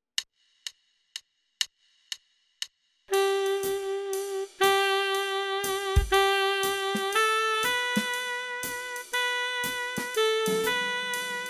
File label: melody only; Row Your Boat